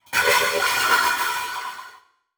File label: Transition Sweep Warble; Production Element